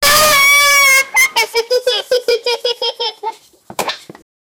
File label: toast boing boing milk, children